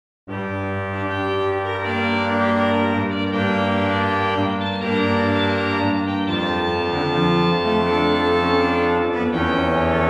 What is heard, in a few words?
Noel, Organ Music, Souvigny; Boely